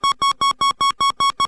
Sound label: LAUNCH; falcon